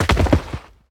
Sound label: Bodyfall Dirt; SFX Kit; Bodyfalls